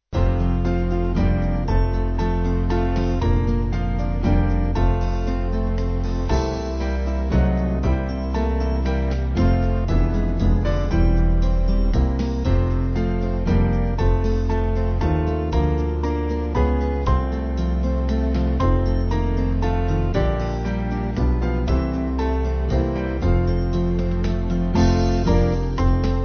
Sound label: band, Music Backing Files for Churches; Dying With Jesus; Moody